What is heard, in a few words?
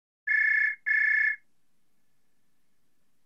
calling; bp better messages websocket